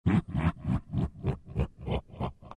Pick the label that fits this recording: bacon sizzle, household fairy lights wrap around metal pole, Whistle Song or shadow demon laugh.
shadow demon laugh